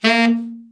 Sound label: TENOR, SAX; Best Service Gigapack 1